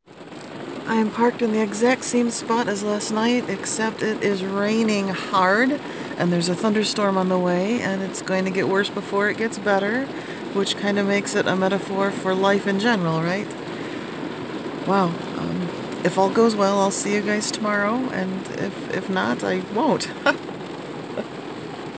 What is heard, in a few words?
raining hard